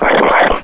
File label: bullsquid snort; hl2